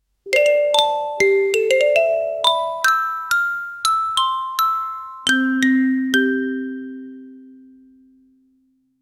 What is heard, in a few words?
playful wonder brighter